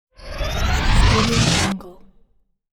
electronic harsh riser

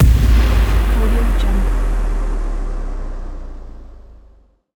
boom

